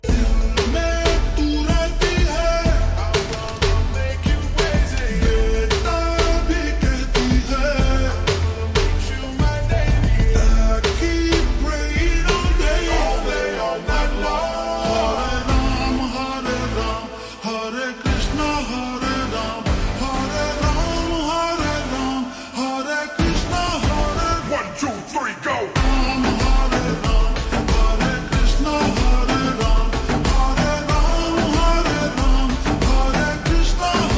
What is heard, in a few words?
Slow Reverb Version Ringtone; bhool bhulaiyaa 3